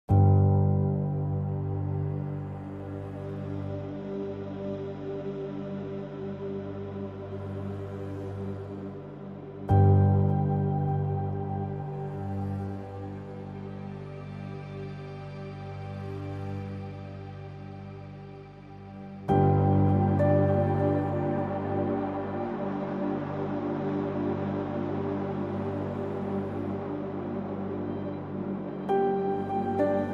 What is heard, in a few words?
Ambient